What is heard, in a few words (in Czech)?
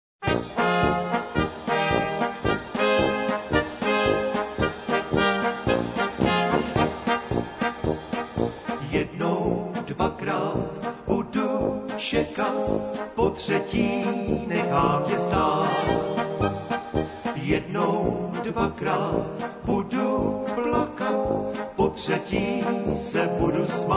SMES POLEK